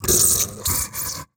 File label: Transition Feedback Electronic; Adobe; Production Element